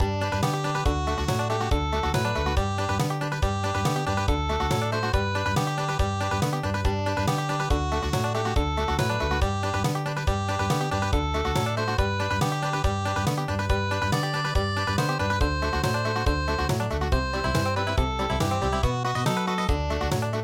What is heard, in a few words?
Country, traditional; Duncan Gray